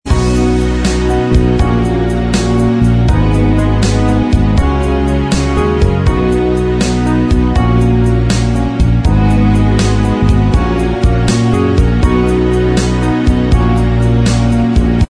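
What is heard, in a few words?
instrumental track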